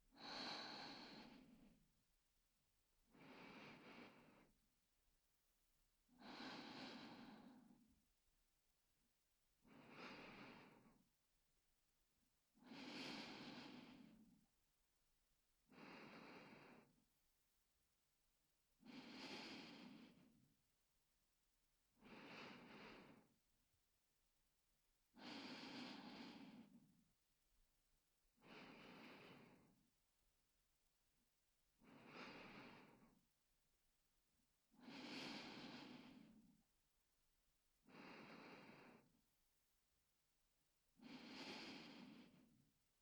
寝息 右側

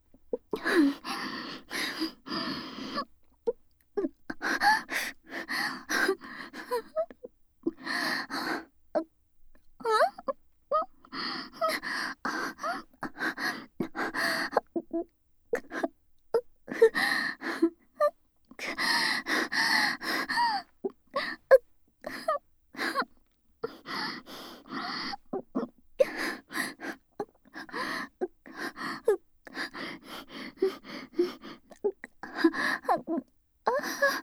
密着吐息喘ぎループトラック, ASMR; フォーリーサウンド; 他人に興味ゼロな美少女クラスメイトが『事務的妊活パートナー』に選ばれた話

